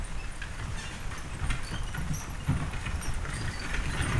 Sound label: Horse Drawn Wagon By; Electa Quinney